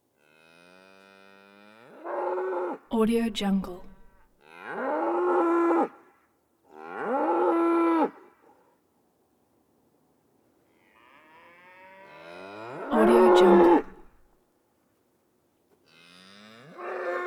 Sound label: steer bellows several mooooooooo agitated cows; prosoundfx